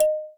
Ping Ready

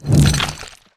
Ravager Punch

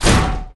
Dagger Throw

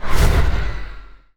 Phantom Spawn; Polterghast

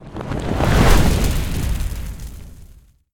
Spawn

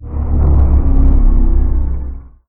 Primordial Wyrm Charge

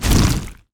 Slime God Big Shot

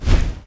Fireball

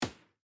Splatshot

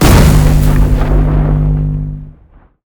Gauss Nuke Explosion

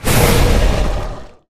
Missile Launch; Ravager

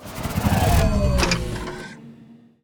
Teleport; Draedon

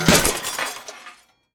Wulfrum Machine Break

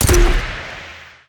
Bazooka

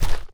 Hit

